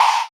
Wah Kick; Sonic Foundry (Sony Creative Software); Esoterik Beatz; Wah Kit

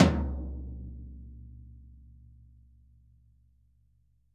VINT KITS; Sonic Reality - Vintage Timetraveler

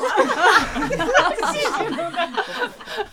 NOISE, CLASSIC VOX; Best Service; Hallelujah

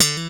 POP HMR D4, slap bass

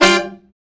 BRA SECT; BRA SECTIONS

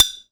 Mug, household rhythm samples Hits

